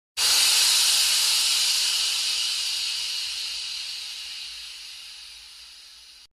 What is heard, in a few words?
steam release